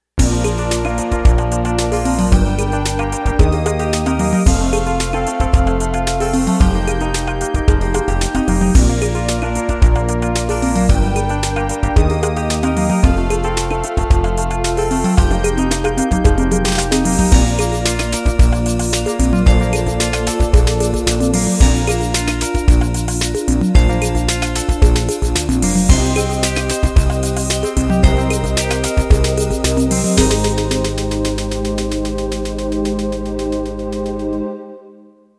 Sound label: 16 beat analog